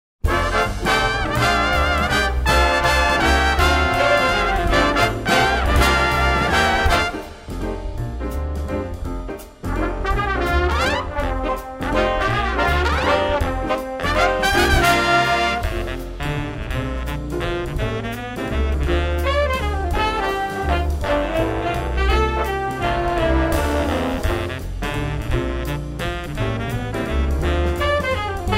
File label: Country Waltz